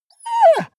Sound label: dog whine; natur-tiere; hunde